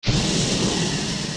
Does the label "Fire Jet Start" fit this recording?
Yes